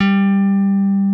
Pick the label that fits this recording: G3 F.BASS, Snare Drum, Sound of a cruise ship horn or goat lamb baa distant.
G3 F.BASS